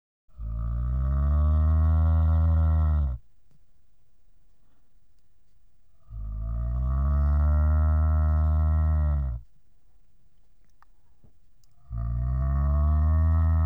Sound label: Animals, Cow; BBC Sound Effects Library